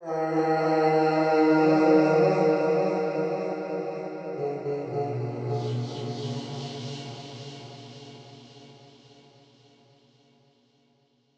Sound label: 110bpm E, Vocalpack